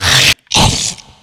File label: spitter spit; umbrella swarm